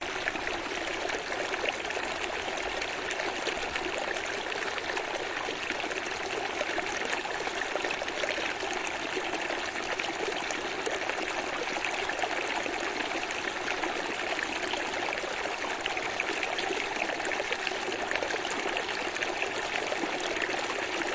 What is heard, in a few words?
little creek flowing water, La Paz Valle de las Animas; your free sounds